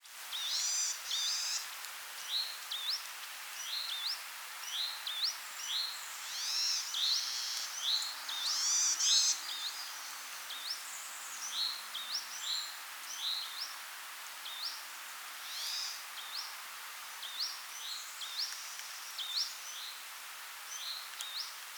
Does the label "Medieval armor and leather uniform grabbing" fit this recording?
No